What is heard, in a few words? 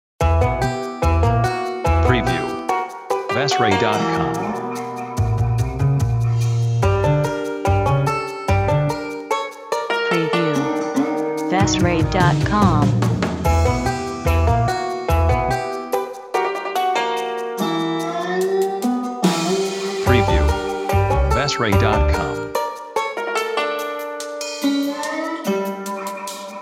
Dobro Banjo